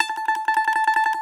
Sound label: BAGLAMA T1AR; E-MU Producer Series Vol. 7 – Old World Instruments